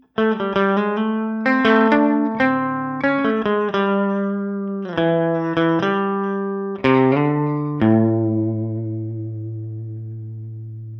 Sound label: Clean VOX AC30